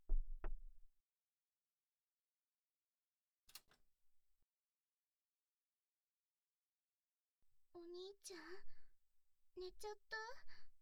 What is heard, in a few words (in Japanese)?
匂い嗅ぎ・耳舐め, ASMR